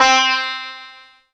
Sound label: Yamaha TG100 Sitar C4